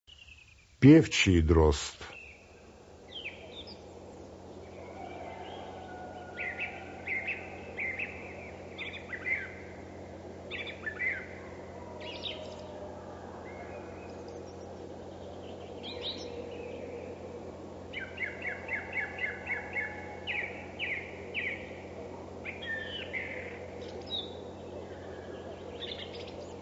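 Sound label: pevchiy drozd golos, song thrush voice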